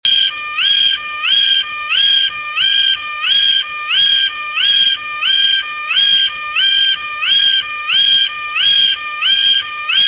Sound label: emergency alert siren